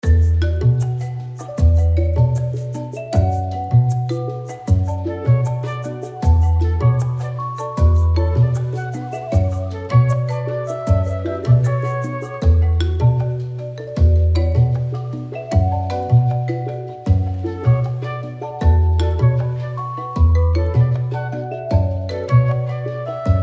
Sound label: Infomercial Loop; Agent A A Puzzle in Disguise; Windows